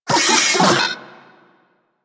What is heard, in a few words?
Vocal Pain; Warframe; Corpus; Mini Moa